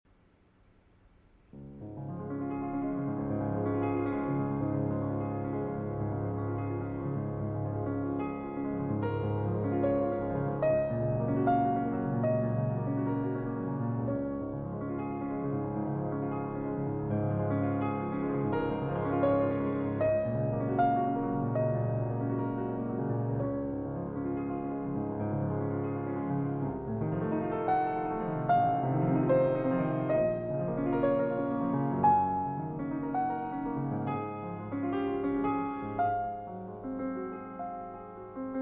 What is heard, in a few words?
Etude in D Flat, Allegro Affettuoso; 3 Etudes de Concert, S.144 No. 3; Un Sospiro